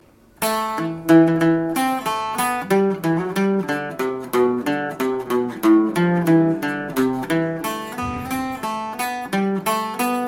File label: Paddy Ryan's Dream